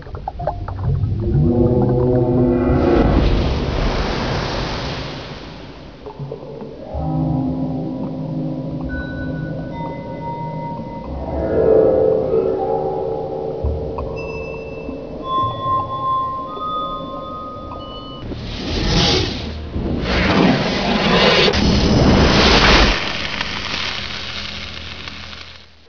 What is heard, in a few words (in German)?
zeittunnel